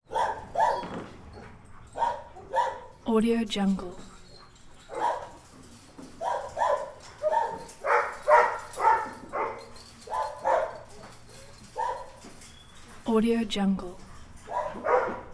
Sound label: dog kennel; prosoundfx